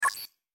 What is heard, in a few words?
error